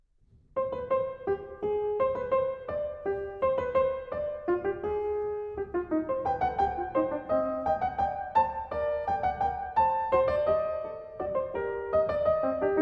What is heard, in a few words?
Prelude Fugue in C Minor; Well Tempered Clavier Book I No. 2; BWV 847; II. Fugue